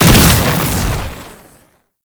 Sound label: Concrete Archgun Projectile Empowered Start; Warframe; Archwing